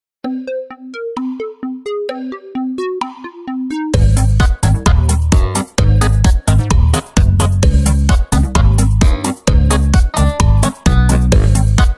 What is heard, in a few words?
20 Second Countdown Mix; Kahoot